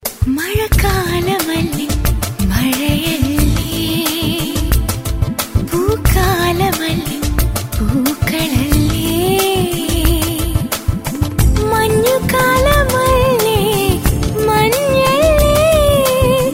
suprabatham; ringtones

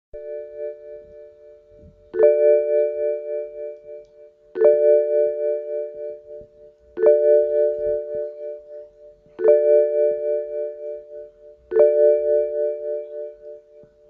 Bmw 335i

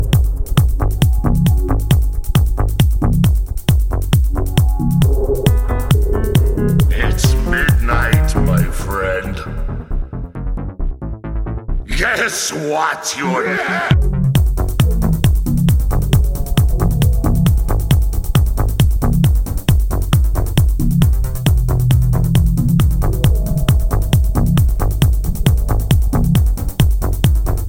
unusual ringtone, electronic

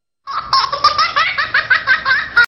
very cute baby tune

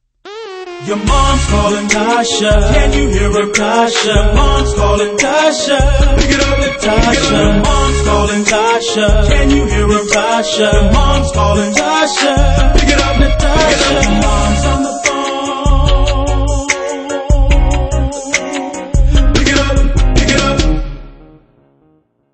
Is Calling Pop

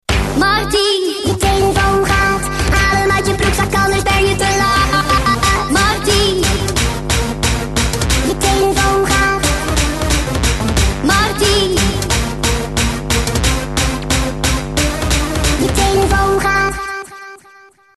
hardcore

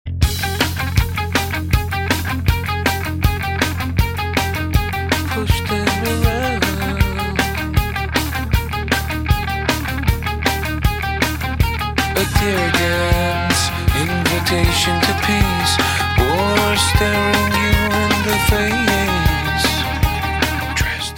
electric guitar, rock; ringtones

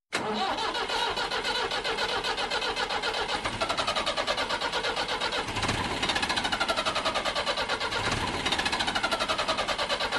cant startup engine

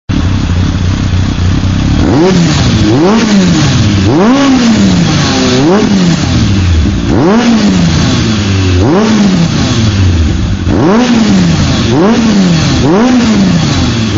kawasaki zrx1200; ringtones

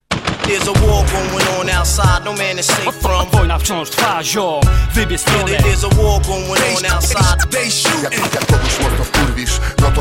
Scratch

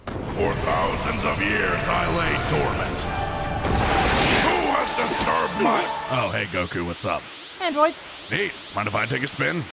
Piccolo

